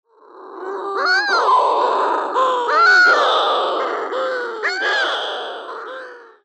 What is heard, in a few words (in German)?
Balearensturmtaucher Puffinus mauretanicus; Vogelstimmen